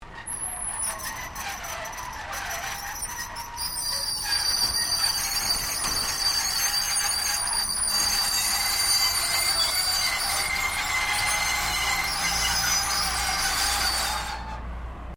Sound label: Door Roller Aluminium Lower Metal Scrape; Various doors